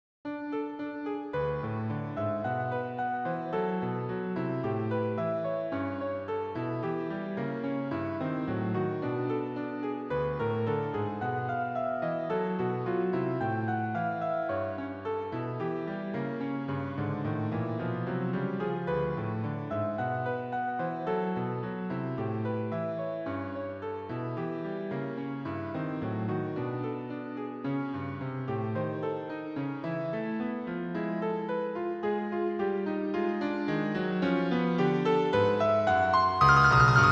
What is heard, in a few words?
gavotte